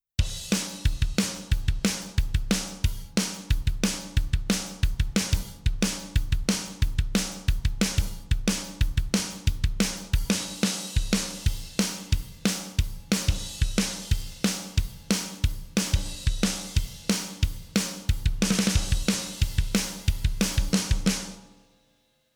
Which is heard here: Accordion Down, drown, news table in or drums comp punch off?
drums comp punch off